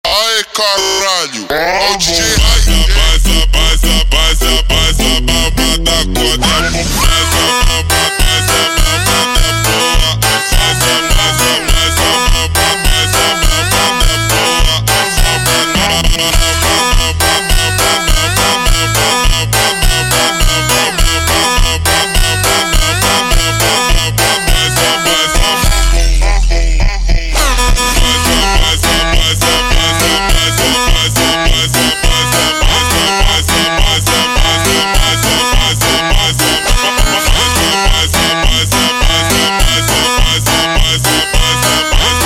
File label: Funk super Slowed